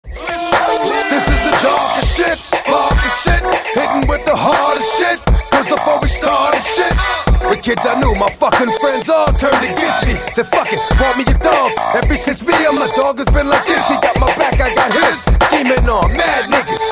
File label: Beat